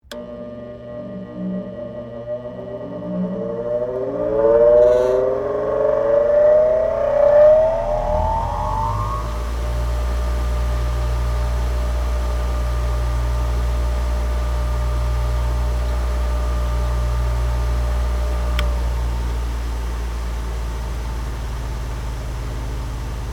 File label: Grinder On Run Off, Industry, Grinder, On, Run, Off; Machines; BlastWave